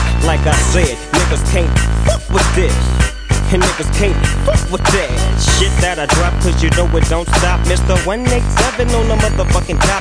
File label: pistol; prestrelka